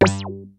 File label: HARD BASS; Kid Nepro; Elite